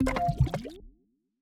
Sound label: Fuel Tank Pickup